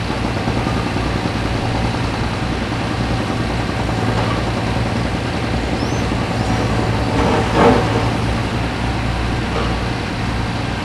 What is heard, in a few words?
Ambience Exterior Quarry